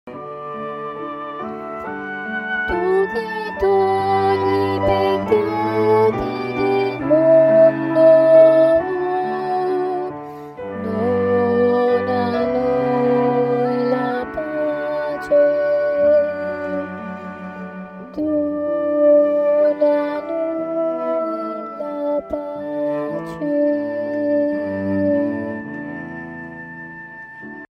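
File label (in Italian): contralti; concordia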